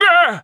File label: Wizard Pain